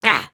Spawn; creatures organic; Lego; Strombie

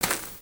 Footstep; creatures organic; Lego; Strombie

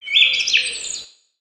Peaceful Bird One shot

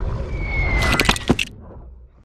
robot bricks drop

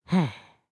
Idle Bored, creatures organic; Lego; Strombie

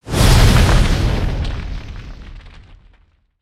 Spinjitsu Fire Swing

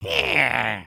Attack Idle, creatures organic

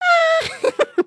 pose, emotes

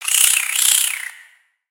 Spider Idle Bored; creatures mechanical; Lego

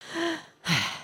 sleep start, emotes

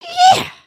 breakdance eeeyah; emotes

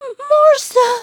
beg

